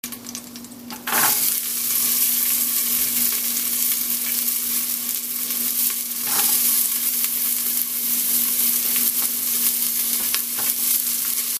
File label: onion sizzle sound; pressure cooker recipes; quick chicken pilaf